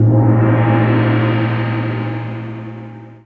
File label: F3 GONG; Greytsounds VOL-1; SDX PERC